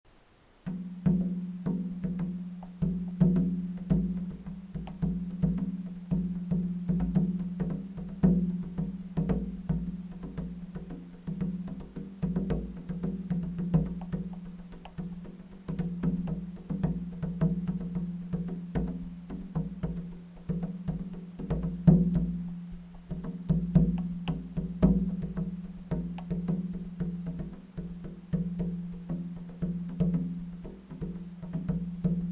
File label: druming